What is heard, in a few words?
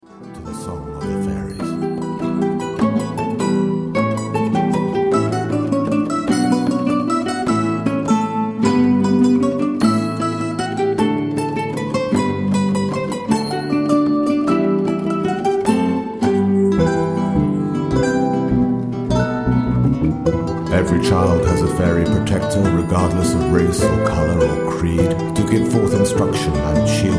Fairy Dance